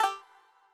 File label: Collect Honeycomb; Banjo Tooie